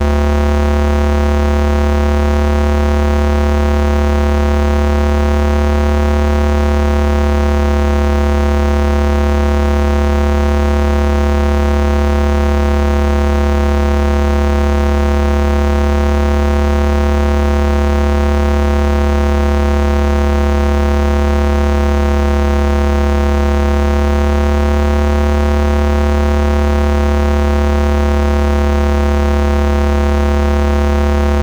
Large Outdoor Bug Zapper, Electronics Of My Grandma's House